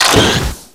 reload

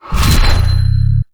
health restored

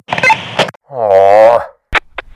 gun spy downgrade; spyvisor

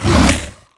bite; breadspace; breadboss

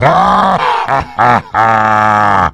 laugh evil but deep; Demoman

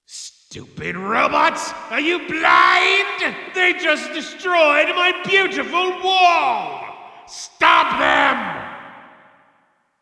wall break; graymann

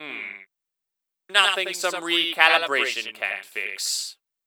MvM Wave Complete; Gray Mann

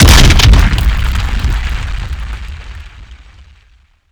attack ground pound; mentrillum; mvm

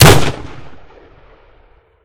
china lake shoot

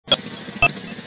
bustle loop

